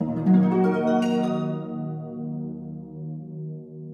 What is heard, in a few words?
122 BPM Harp A maj F min Tonic F; mmb studio soundtrack essentials